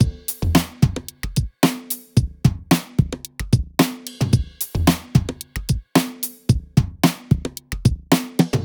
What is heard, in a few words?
Drumloops; MixterPlus